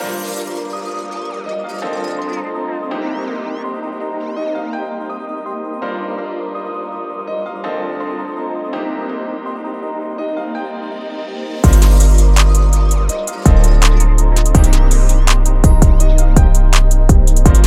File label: Gm BPM165